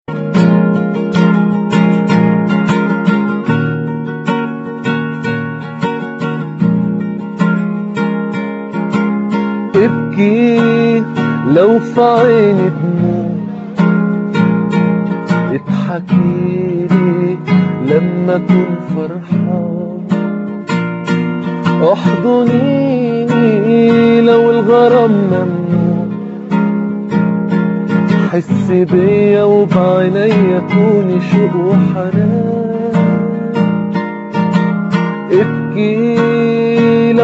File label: Guitar; egypt